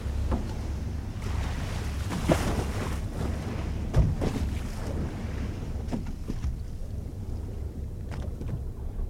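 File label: Ambient harbour atmosphere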